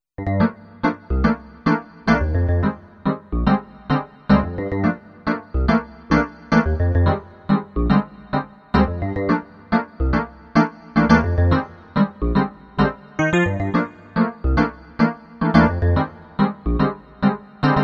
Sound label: korg m1r synth